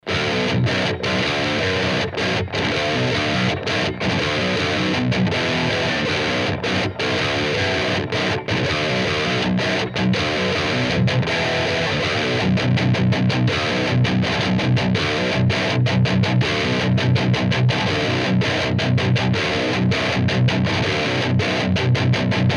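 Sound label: Eclipse schecter distortion, 7string; Eclipse schecter przester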